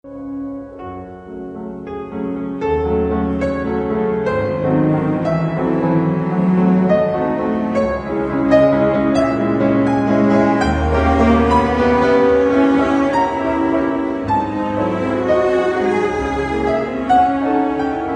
concerto pour piano, vivace; RACHMANINOV

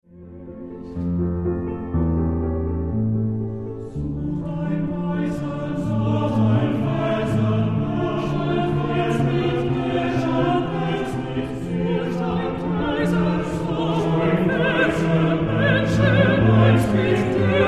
Standchen Sop Et Choeurs; SCHUBERT

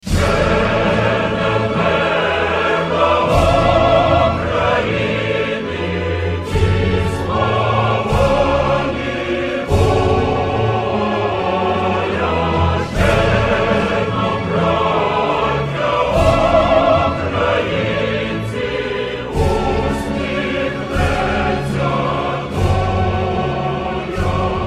hymne national ukrainien

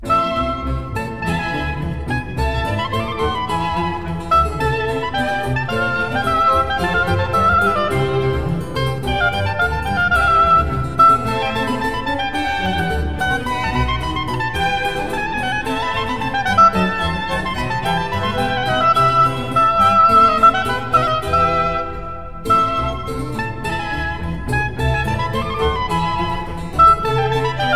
concerto pour hautbois en A minor, allegro; SCARLATTI (Alessandro)

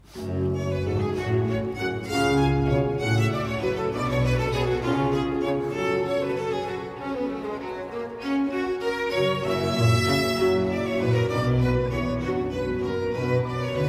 duo sop choeur; HAENDEL, Passion de Brockes Hwv 48, 63; wohin

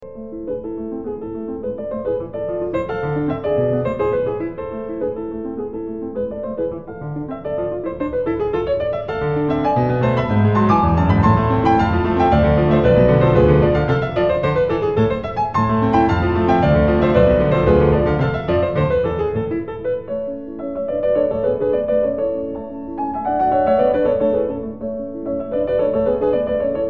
sonate pour piano, presto; MOZART

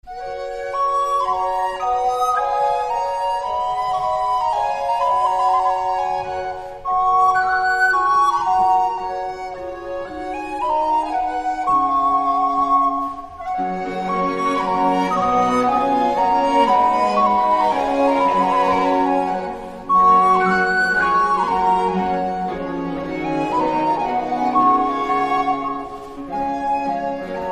flute; PURCELL; King Arthur

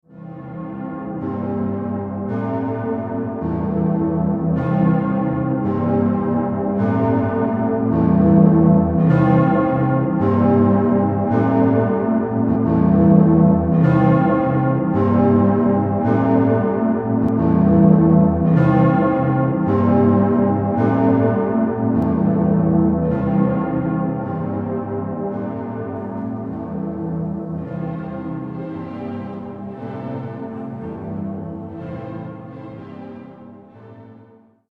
cloches; WAGNER; Parsifal; nun achte wohl

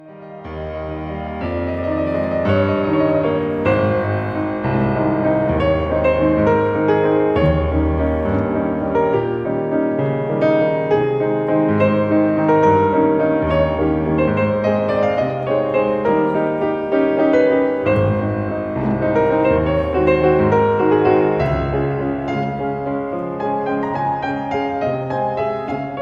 concerto pour piano, valse; BEETHOVEN

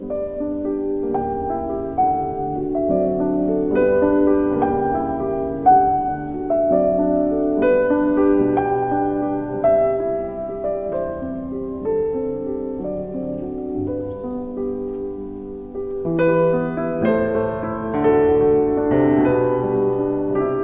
scenes enfants pour piano; SCHUMANN; martha argerich

